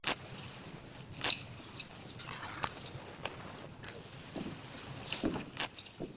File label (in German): Streichen